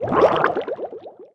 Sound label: drown, player hurt; Minecraft; Votive World